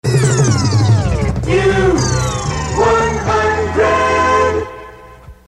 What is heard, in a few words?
Jingle; WYOO